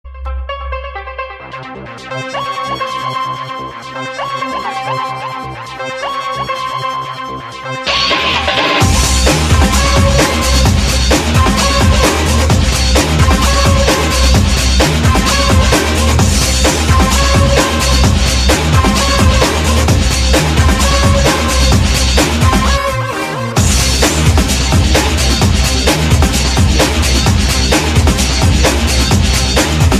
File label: goa break